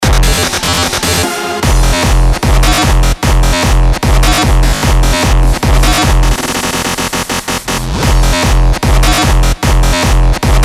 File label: Hardstyle